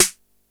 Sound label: snare, Yamaha RY-8 drums